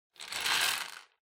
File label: Drawing Machine Garapon Noise